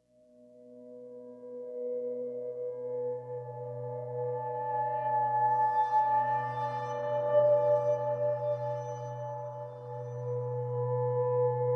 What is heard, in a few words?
No Strings; Ninja Tracks